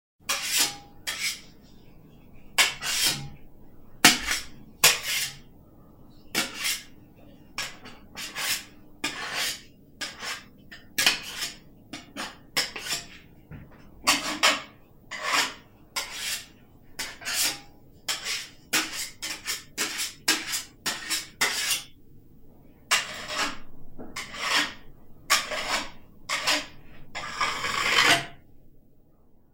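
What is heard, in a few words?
spatulas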